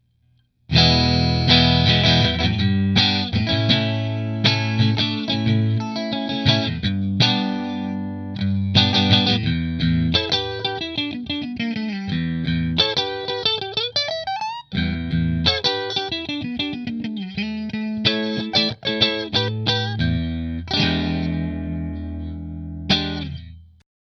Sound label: Rahan Sunburst Position 2 Fender, guitars